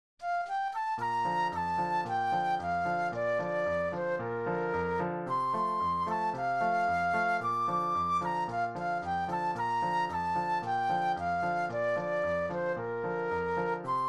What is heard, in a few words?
Flute Solo; christian solos; I've got the joy joy joy